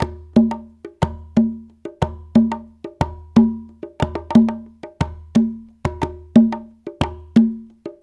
120, Latin Congas; Spectrasonics; Supreme Beats

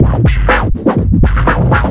FILTER, Big Beat Drumloops

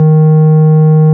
ORG, Farfisa

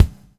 BUBL, BUBBLE; Spectrasonics - Backbeat

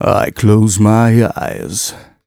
m 127 mel a, Vocals

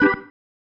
Stab One Shots, Organ

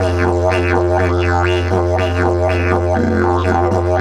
120, Didgeridoo

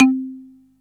MARIMBA C3; East West; Global Sounds

